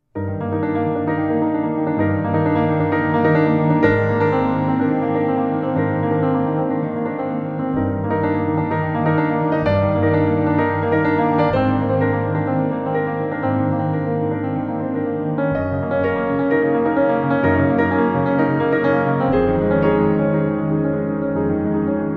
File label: Echo Stereo Out